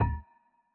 reel Bounce; netent

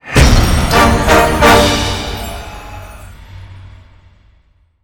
largewin; netent

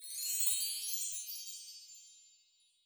scatter Win; netent

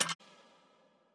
button General; netent

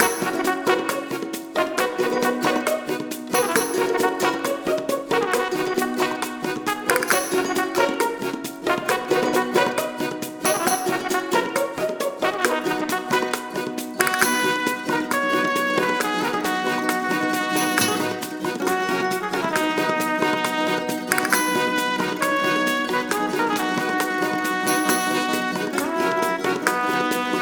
ambience Music Layer; netent

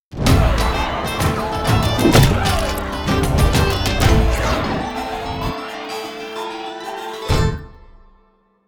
random Overlay Start; netent

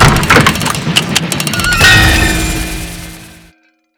lottery stop spreading wild; netent

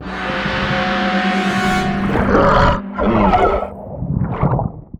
Creature Hit Arm Anim

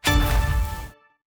big Win Super Mega Transition; netent

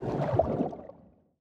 spin end; netent

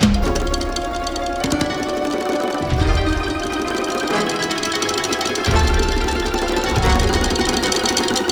excitement spin; netent

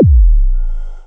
scatter Boost; netent

